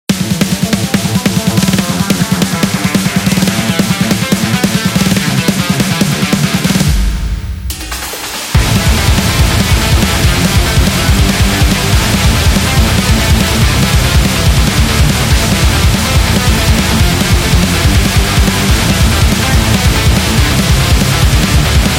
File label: Speed Metal; sport rock